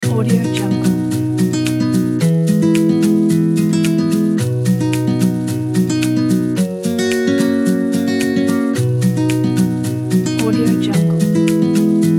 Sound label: school